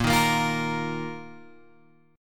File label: Bb Suspended 4th, Bb, down, Guitar, Open G